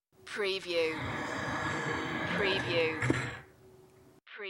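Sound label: Stool Swivel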